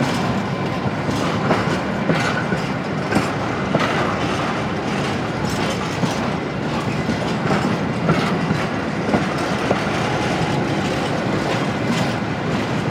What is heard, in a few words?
train no damage